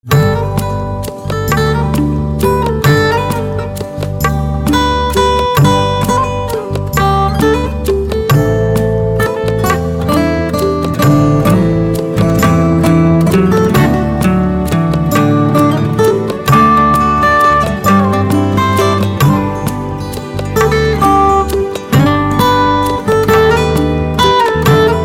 Guitar

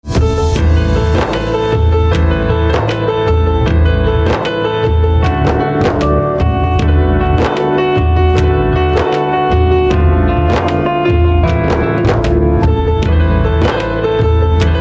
Piano

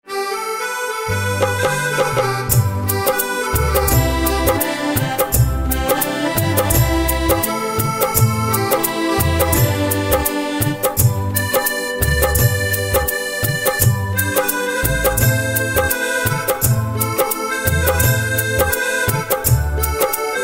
Accordion

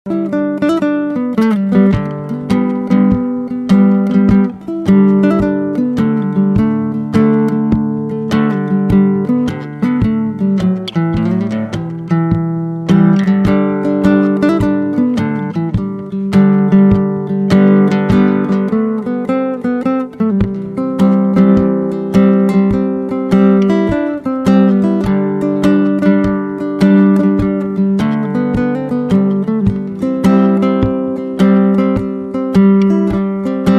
Guitar

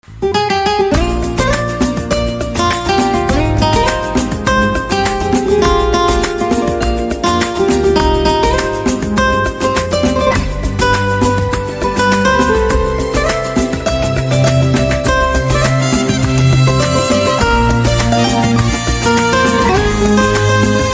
guitar Instrumental Ringtone

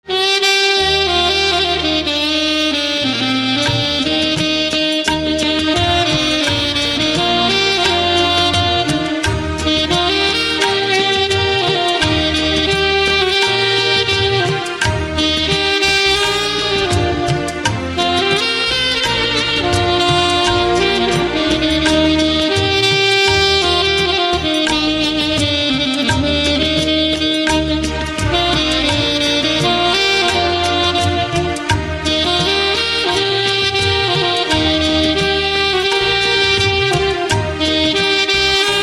Saxophone, instrumental ringtones

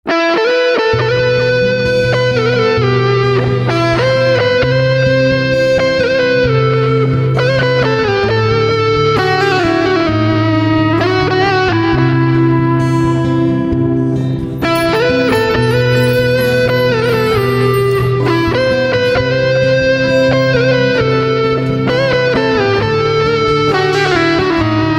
Electric Guitar, instrumental

